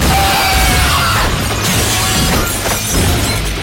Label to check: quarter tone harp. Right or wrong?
Wrong